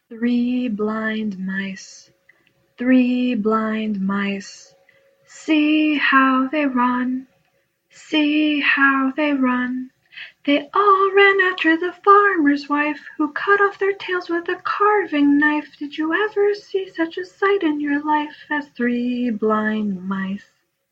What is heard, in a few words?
three blind mice, nursery rhymes